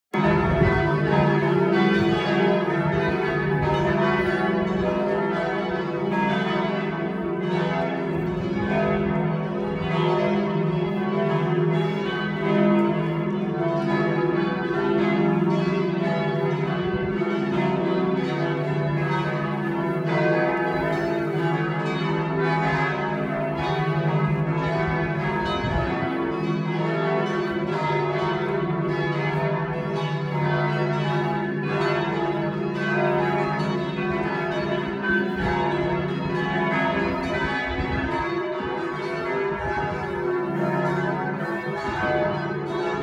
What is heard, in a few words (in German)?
st nikolaus turmgelaeut; Museumsglocke; Glockenkunde; Die Museumsglocke in der ehemaligen Abteikirche Brauweiler (St. Nikolaus)